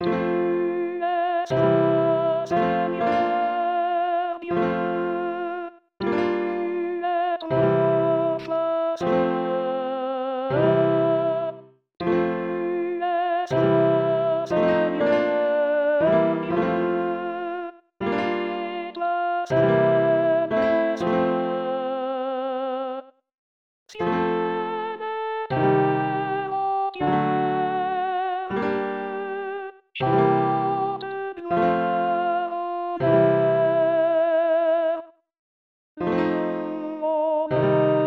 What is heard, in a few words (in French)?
Tu Es Saint Seigneur Dieu Alto Accords, Chorale